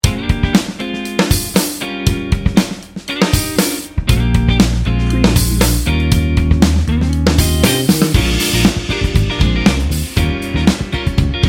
funky and cheerful bed

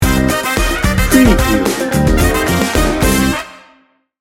funky bones sting bumper

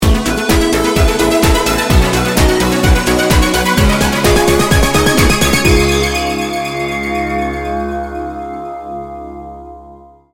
electro dreams sting bumper

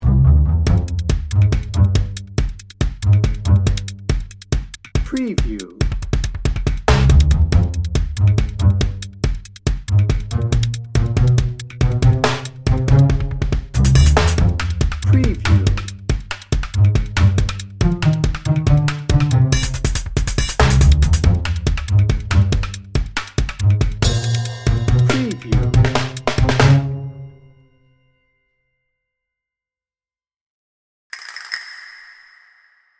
busy pluckers dnb

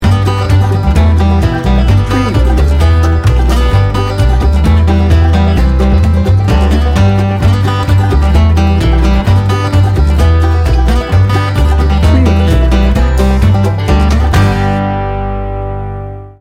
bluegrass blowout

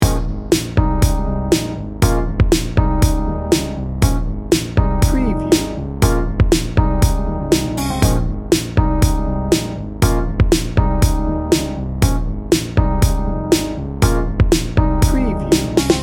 80s pop r&b loop

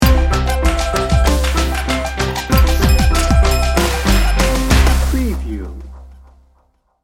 percussion party sting bumper

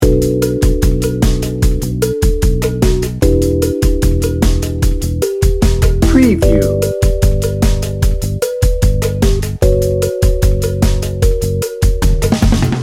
easy breezy loop

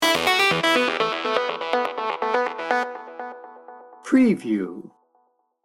dance magic sting bumper

